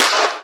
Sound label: Claps & Snares